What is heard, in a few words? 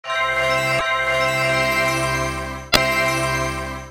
clouds rainbow; Treasure Hill; Attractions